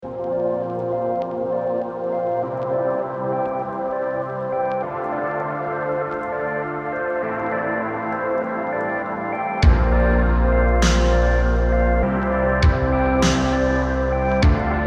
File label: lo-fi electronica